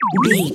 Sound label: ui menu button beep